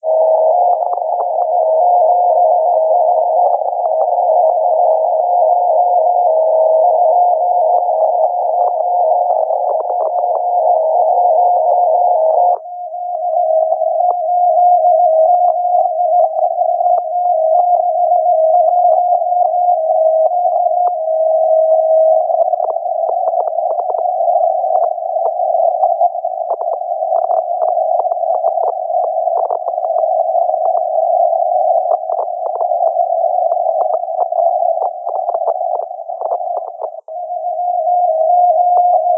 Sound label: 21 kHz morse; 21.1KHz; FSK Morse